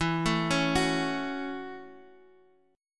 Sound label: Gb Minor 7th